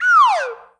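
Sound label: Slidewhistle Down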